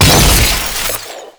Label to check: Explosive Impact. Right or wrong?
Right